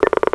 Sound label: dialing; WAV Sound Effects; houshold; clokphon